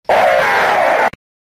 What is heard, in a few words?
jumpscare sound; night shift at samuels original